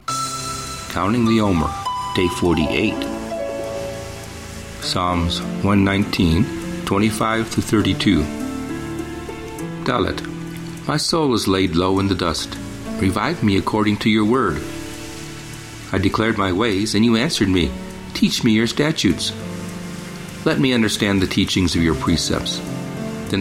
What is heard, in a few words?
Meditative w Waves Ocean; Omer; Psa119